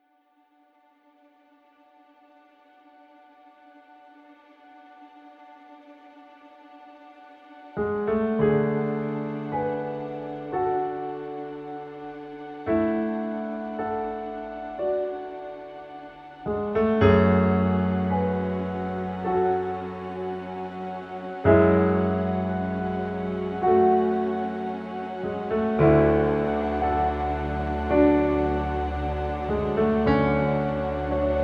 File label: Emotional